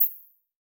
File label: Beep Electronic; Multimedia Internet CD-Rom Flash